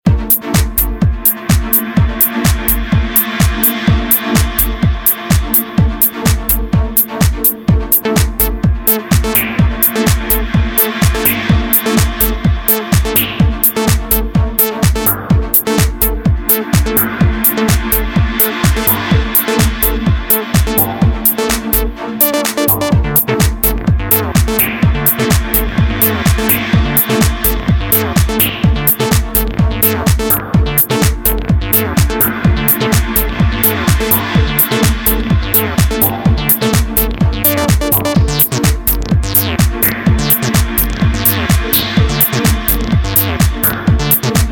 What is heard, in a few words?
Deep House, ringtones; Samsung SGH-T839-Sidekick-4G